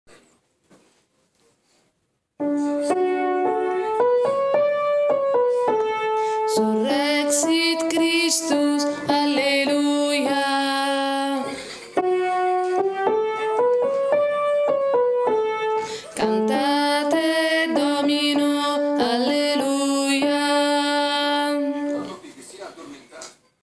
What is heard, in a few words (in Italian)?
contralti; surrexit christus